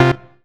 Synth Shot; Vengeance Samples

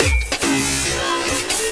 Fill 140 BPM

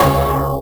Synths C#; Vengeance Samples

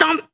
Vocal Oneshots; Vengeance Samples; Vocals

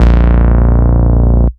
Root A#, Ultimate Bass; Vengeance Samples

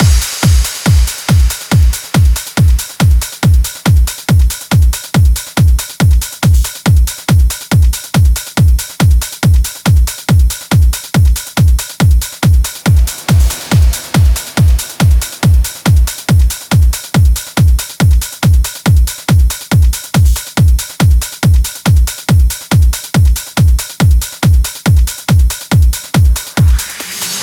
Drum Mix 16bars 140BPM; Drums & Perc; Vengeance Samples; Incast Kit; E minor

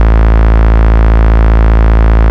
Bass Root A; Vengeance Samples; Vengeance Ultimate Bass

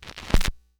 Needle Drop Old, Vinyl Record Samples; Needle Noises

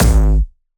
Jumpstyle Kick G#1; vengeance essential club sounds-3; VEC3 Bassdrums

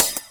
hat; Breaks N Beats Sound Kit

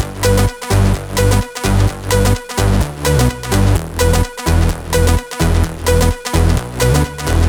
Melody 2, 128BPM; Jelly Kit

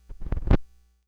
Needle Pop, Vinyl Record Samples; Needle Noises

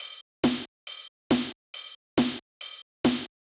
Manhattan Kit 138BPM Loop, Drums & Perc; G#minor; Vengeance Samples

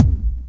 kick; Breaks N Beats Sound Kit

